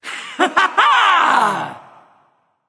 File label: yay; Smite; athena